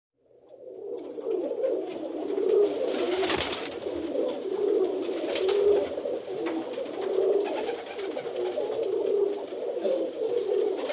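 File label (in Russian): dovecote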